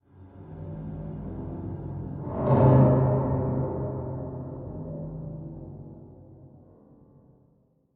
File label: music - mystery - ambient mood; You Are Empty